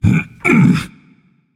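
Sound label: non-verbal grunt lift; smite; atlas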